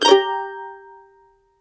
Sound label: CEE CHORDS G2M, Blues Guitar Samples